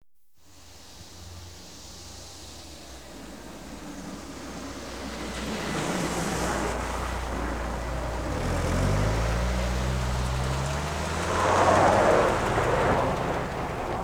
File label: Audience Applause